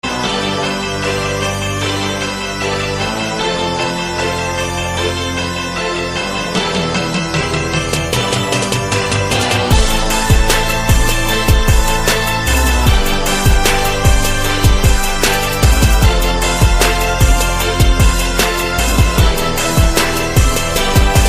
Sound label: Latest Gangsta Beat